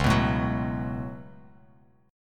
Db 7th Suspended 4th Sharp 5th Db down Bass Standard; JGuitar